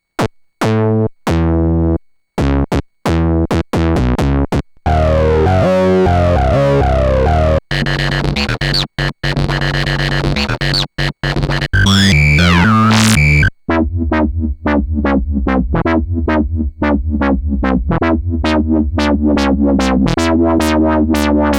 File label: monologue presets brutal